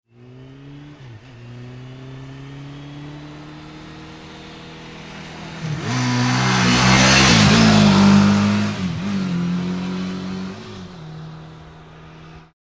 Motorbike Suzuki 650 Pass Fast; Motorcycles; Vehicles; Motorbike Suzuki 650 Pass Fast 2

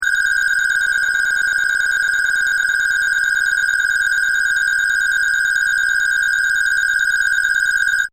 SciFi Scanner; Communication Sound Effects

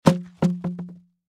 Wrapping Paper Roll Drop; Materials; Foley; Blastwave

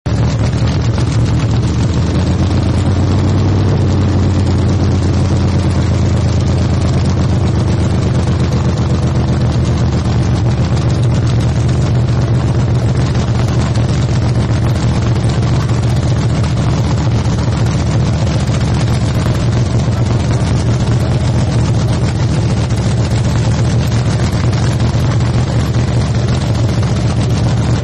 Hot Rod Idle, car; Car Sound Effects; Vehicles

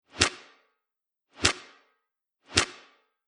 3 Whip Cracks; Crime And Torture - 3 Whip Cracks; Whip Sound Effects; Horror Sound Effect

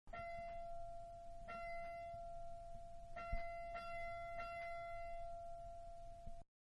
Electronic Doorbell Distant; Doorbells (Sound Effects:Doors); Manhattan Production Music

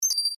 UI Rollover Major, User interface feedback sounds; Computers; Electronics

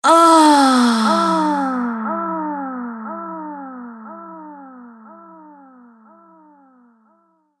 Sex Groaning Woman With Delay

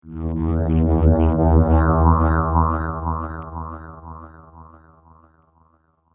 Deep Dark Laser Projector; star wars / trek style games; Andrew Fleming

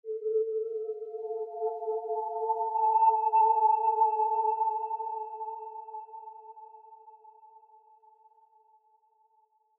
Horror Musical Melody Haunting Ghostly High; Scary Soundscape; Blastwave

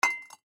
Tea Cup Set Down On Saucer Antique; Tea Cup Set Down Hard On Saucer Antique; Dishware; Household; Blastwave

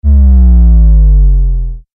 Laser Beam Power Down; Laser Sound Sound Effects

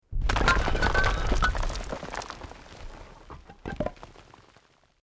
ROCK DIRT SLIDE SMALL

